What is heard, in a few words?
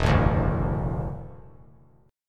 E 7th Suspended 2nd Suspended 4th, down; E7sus2sus4; strummed